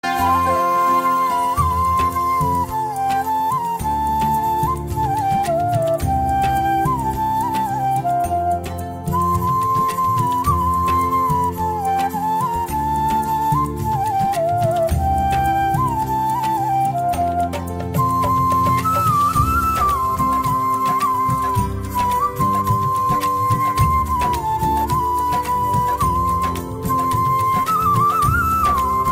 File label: Flute Ringtone